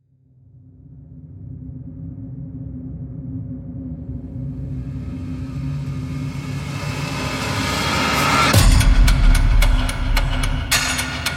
industrial rhythmic pulse

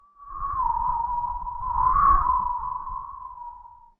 whistling crevice wind

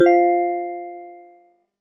upward success chime

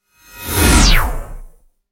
digital glitch whoosh

